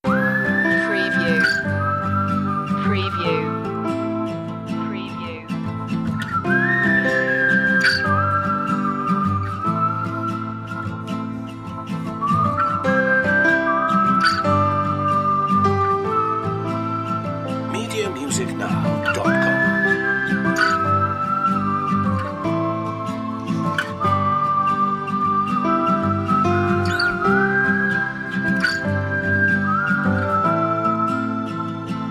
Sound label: the whistle song no drums